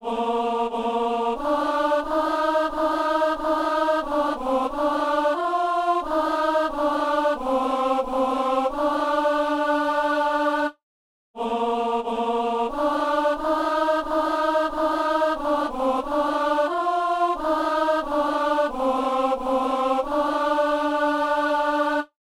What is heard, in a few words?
alto; Prières universelles